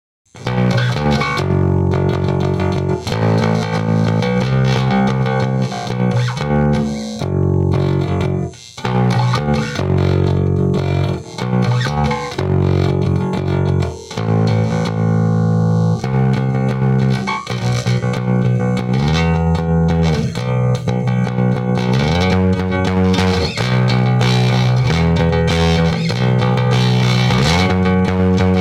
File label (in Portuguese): baixo